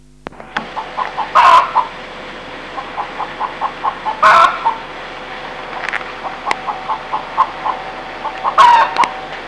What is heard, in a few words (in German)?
huhn